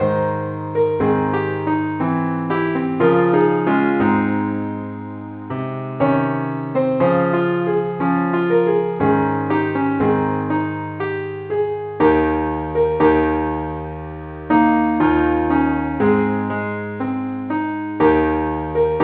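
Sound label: keyboard Only key D flat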